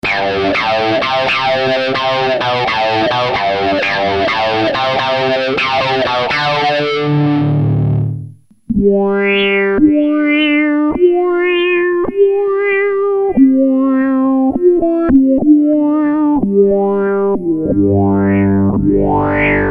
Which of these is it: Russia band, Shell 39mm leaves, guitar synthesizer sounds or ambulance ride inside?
guitar synthesizer sounds